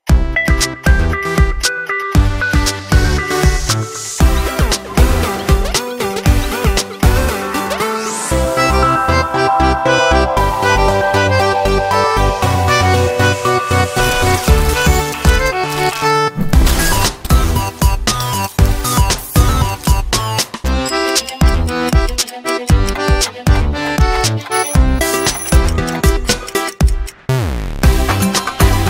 Music; Etisalat Extra

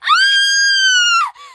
Scream

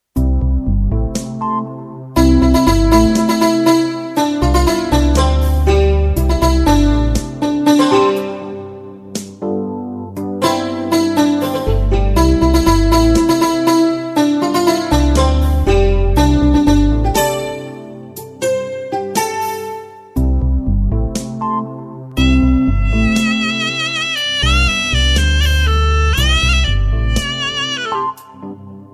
Sign Tune; Bsnl

